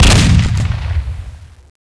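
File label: double barrel, scattergun; svencoop; cracklife; weapons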